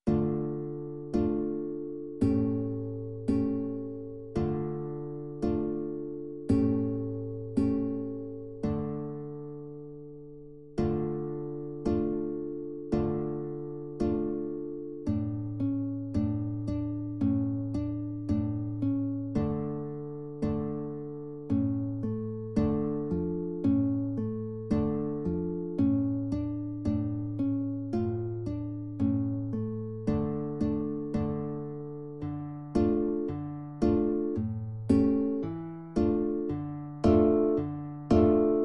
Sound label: accomp